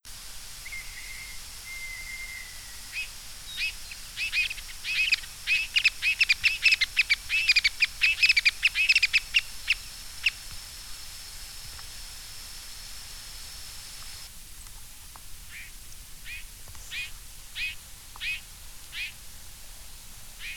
Culicivora caudacuta; Tachurí coludo

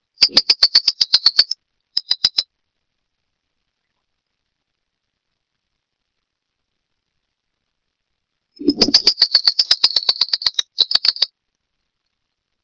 Phleochryptes melanops, Junquero

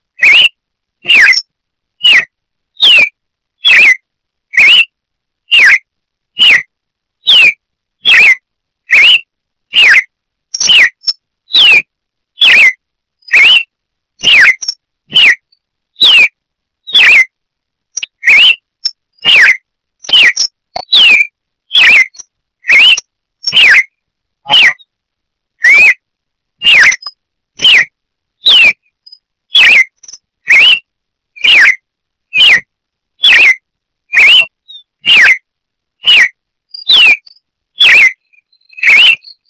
Paroaria coronata - Cardenal de copete rojo

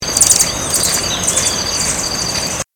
Thalurania glaucopis, Picaflor corona azul

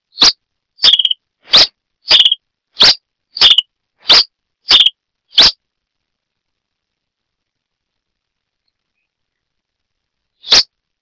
Coryphospingus cucullatus - Brasita de fuego

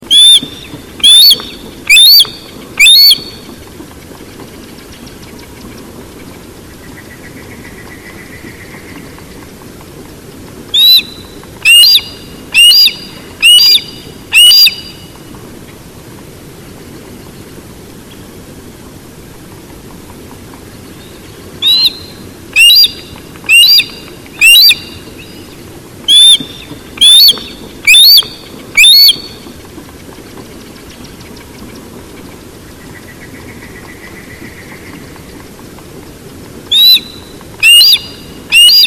Parabuteo unicinctus Gavilán mixto

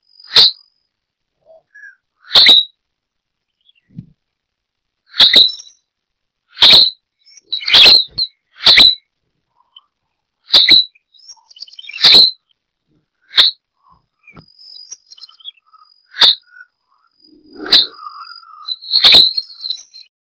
Myiodynastes maculatus - Benteveo rayado

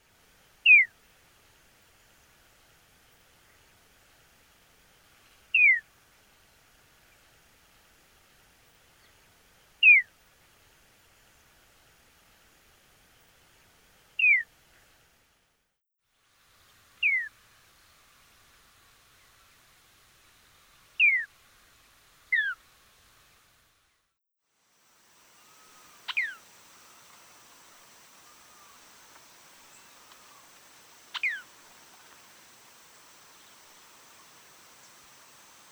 Elaenia spectabilis; Fiofío grande

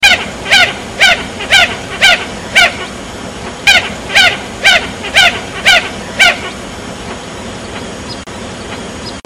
Fulica armillata - Gallareta liga rojas

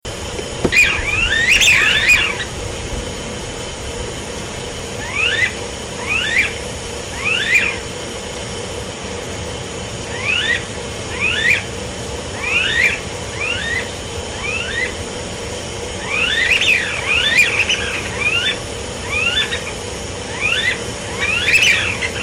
Anas sibilatrix - Pato overo

